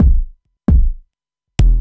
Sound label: Kick Loop, Dance Beats